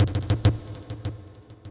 TOM INDST 140, Industrial, Drum

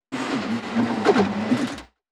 TECHNO FX 140

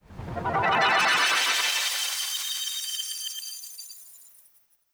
SPACE FX 140; SpaceSounds

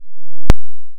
Saw

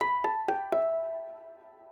ethno, 125, A

